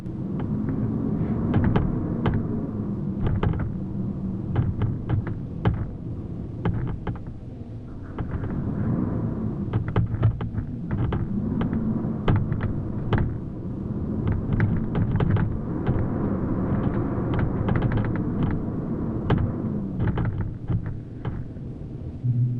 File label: wind howling with shutter banging; WINTER; JERRY APPS WINTER